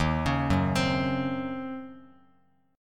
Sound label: D sharp Minor 13th D sharp Bass Standard, chord; arpeggiated; JGuitar